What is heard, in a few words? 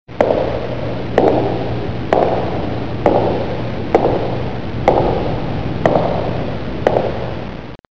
Footsteps halloween